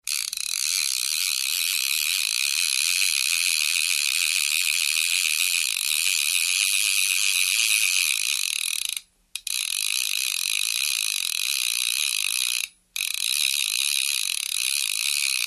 Fish Reel Crank Reel

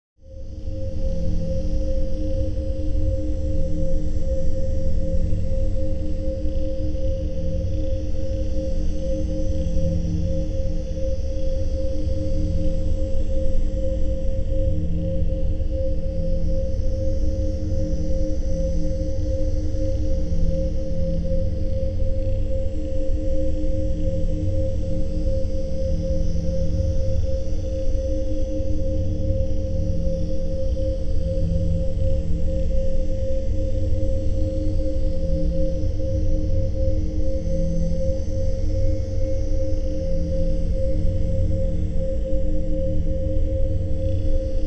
Space Ship Eng Rm Amb

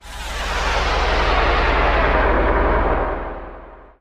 Data Scan Whoosh Fade, stinger

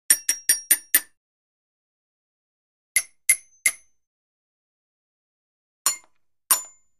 Muted Bells Muffled

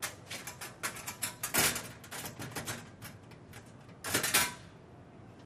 Locker Door Open, Close In School Hallway; lockers

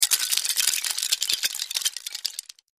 Wood Shims, debris

